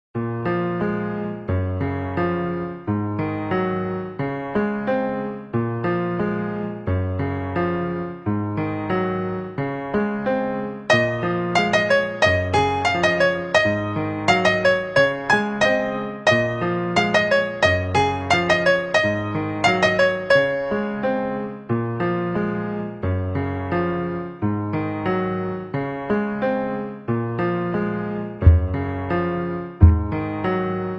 Piano remix

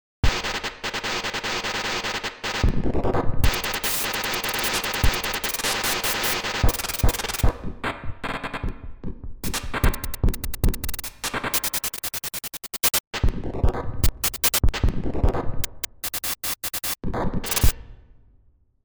white noise

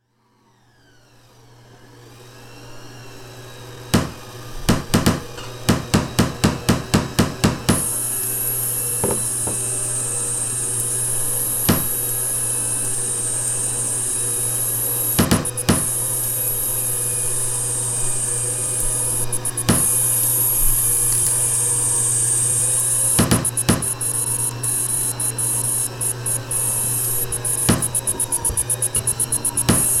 Kitchen Jam

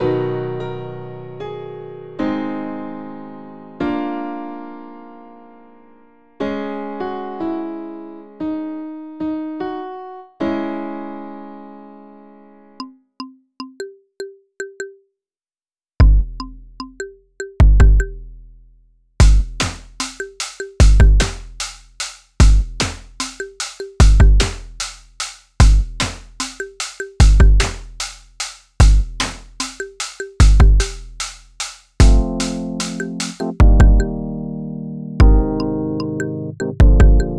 Noteblock Song

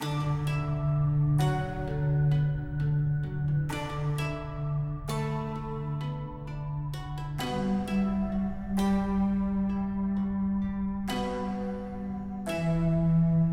Video Game Collab (Japanese style)

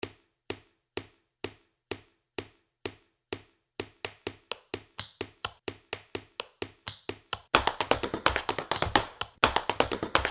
Rhythm

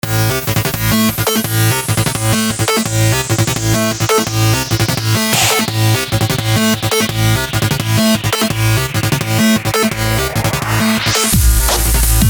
Video Game Song

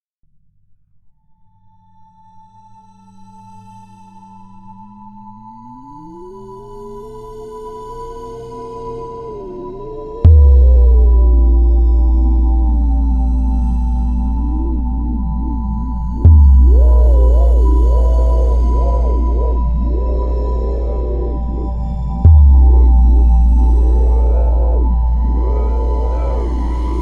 Alien Ship Score

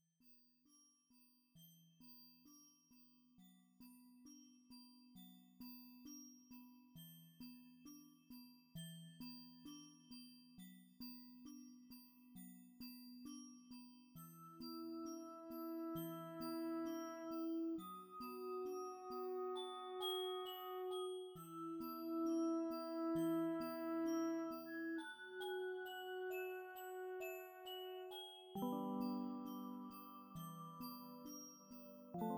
Underwater Magic Shop Theme Song

